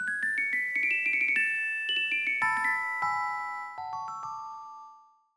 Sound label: jingle open